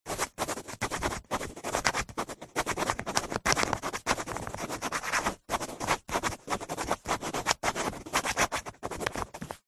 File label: pen ball point writing fast on thick paper